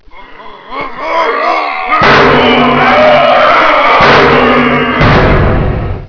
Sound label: zombie burst; svencoop